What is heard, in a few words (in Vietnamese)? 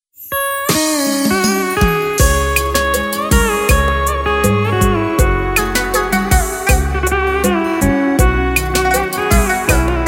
beat